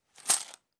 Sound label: case Item Dropped